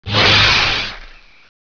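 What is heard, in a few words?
crowbar hit body; svencoop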